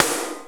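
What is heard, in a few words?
snare drum compress; SNAREDRUMS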